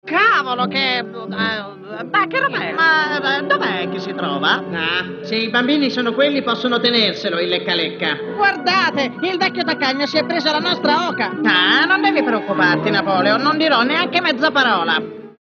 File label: Bass